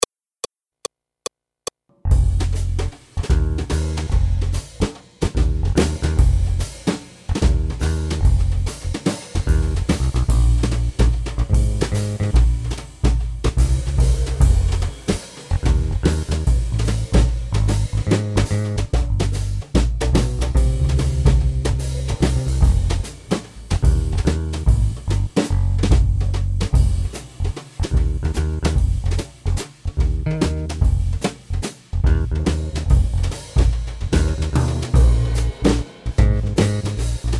Accompagnement seul; Jazz

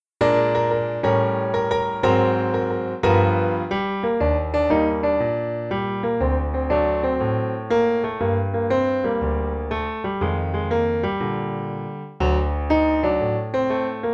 Groovy, Piano seul; Jazz

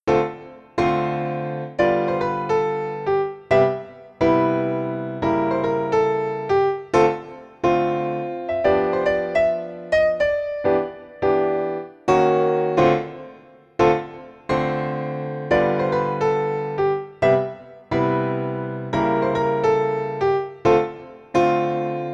Jazz ' N Swing, Piano seul

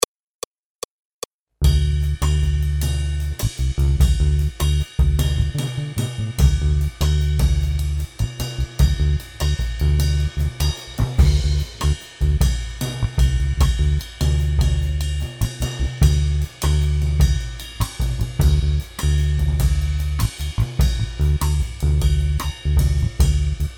Dorian Phrygian Exploration, Accompagnement seul; Jazz

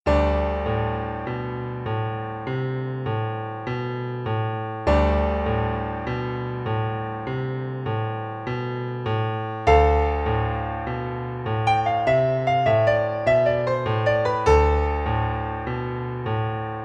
Dorian Phrygian Exploration Piano seul; Jazz

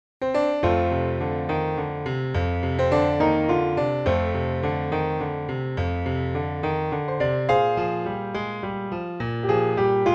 blues Piano seul; Jazz

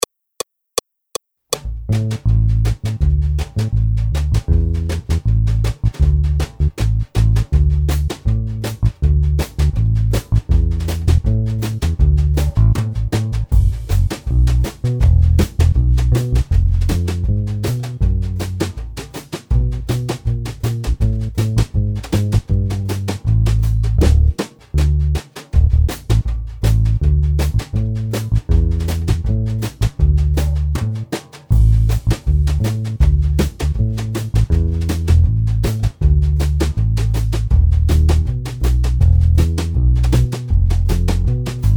rag Accompagnement seul; Jazz

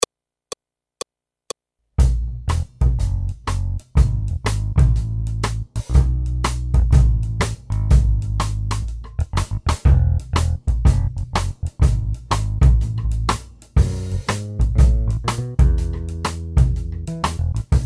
Classical swing Accompagnement seul; Jazz

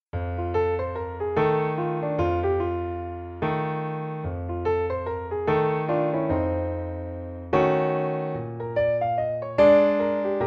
Bluesons noir, Piano seul; Jazz

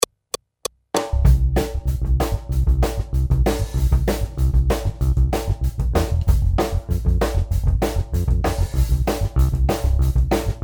Riff Rock boogie, Accompagnement seul; Jazz

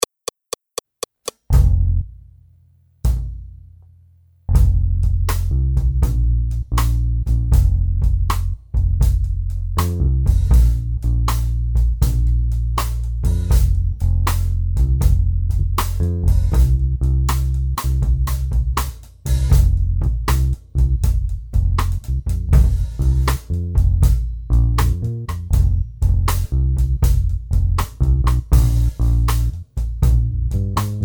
Rockin' blues, Accompagnement seul

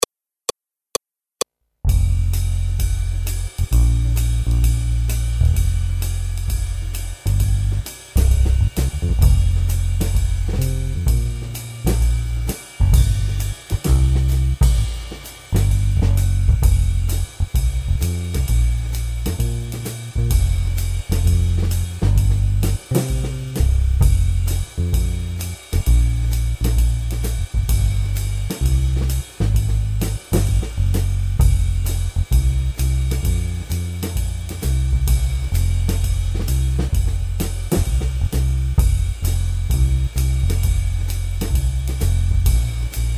Swing Accompagnement seul; Jazz

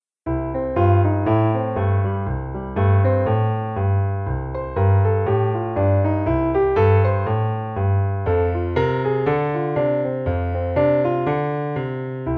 boogie Piano seul; Jazz

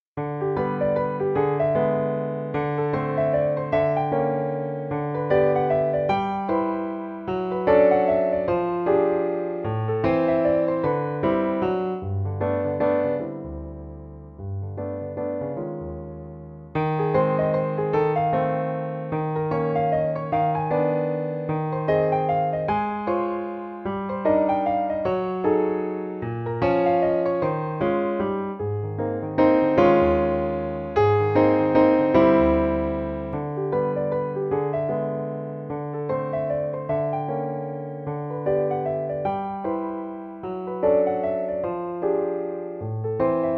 Two keys jazz waltz Piano seul

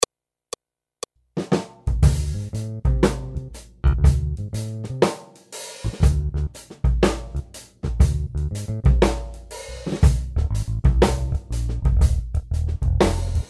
boogie Accompagnement seul, piano; Jazz

